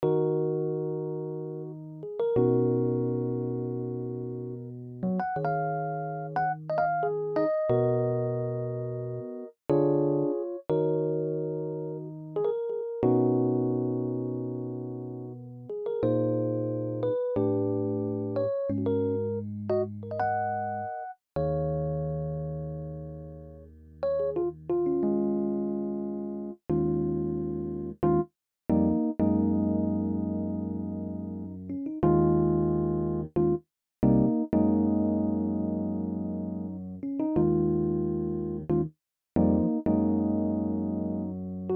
rnb soul 90 bpm

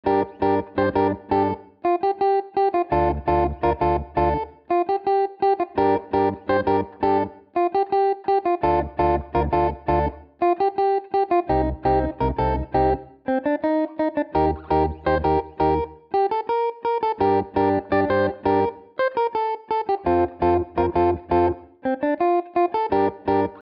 islands 84 bpm

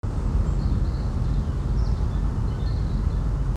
Ambi Loop

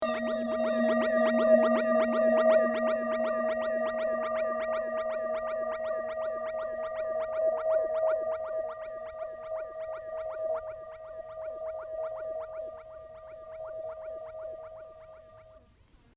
processed prayer bowl

